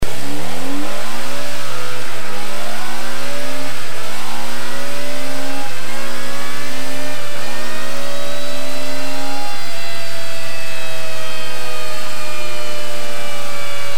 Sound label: BMW R1100RS